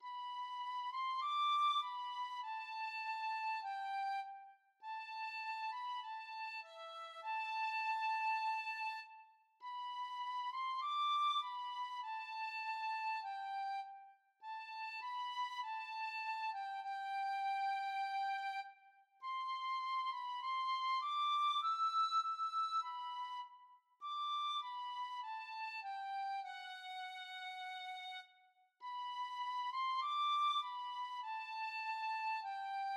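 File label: penny whistle, tin whistle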